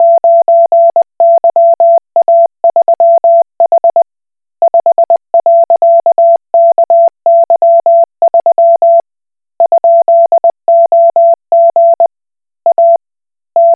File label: Koch Morse